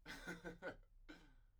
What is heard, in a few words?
laughter; IEEE AASP